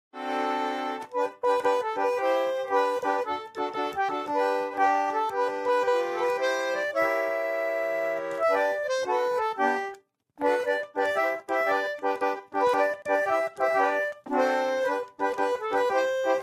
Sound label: Jolly Good Fellow Concertina